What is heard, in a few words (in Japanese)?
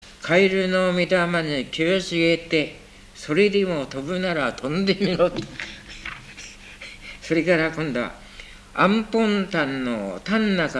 warabeuta